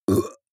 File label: burp grunt short; koerpergeraeusche; menschen; soundpool